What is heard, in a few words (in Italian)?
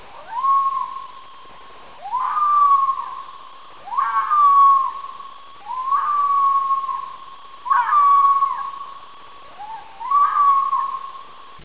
VOLPE, versi degli animali; animalandia